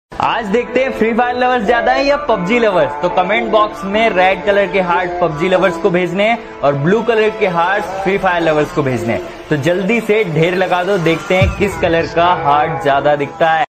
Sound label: free fire ringtone